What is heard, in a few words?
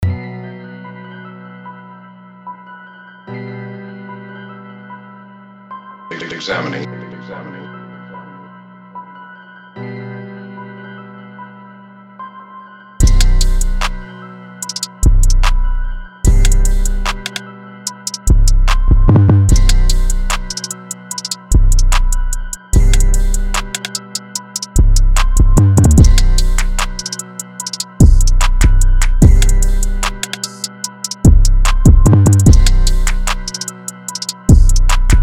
148bpm B Phrygian LOOPS